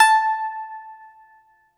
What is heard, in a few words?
BAGLAMA, Plucked Strings